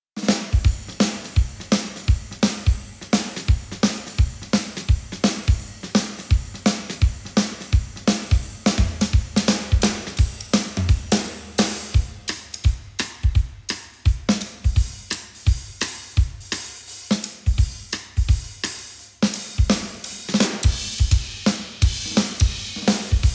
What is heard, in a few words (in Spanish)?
bateria